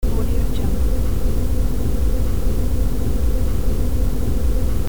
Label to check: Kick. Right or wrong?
Wrong